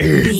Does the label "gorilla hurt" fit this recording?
Yes